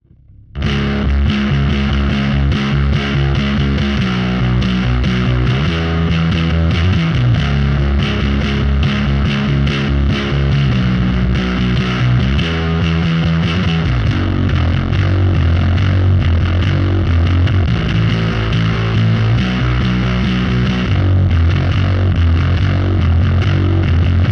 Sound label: BASS FLAT NO BOOST